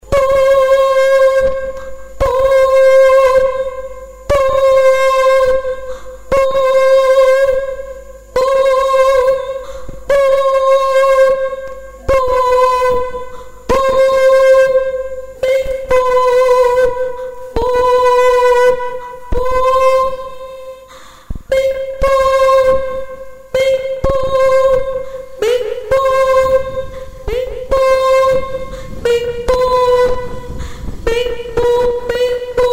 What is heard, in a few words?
tenor